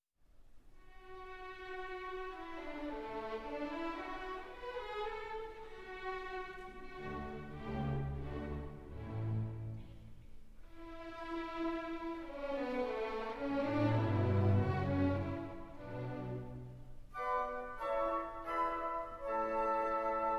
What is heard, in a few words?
Violin Concerto in D Major, Allegro Moderato